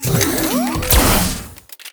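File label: Mech attack 2, monster; steamer crazy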